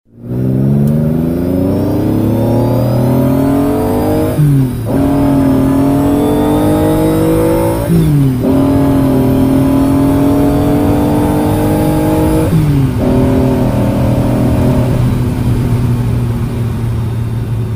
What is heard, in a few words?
Car accelerated; Oppo; Find X903